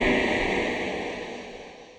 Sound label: Convoluted Back To Back Loop 60 Bpm